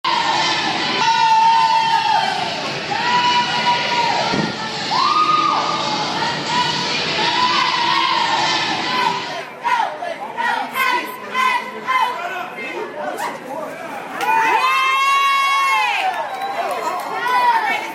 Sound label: Cheerleader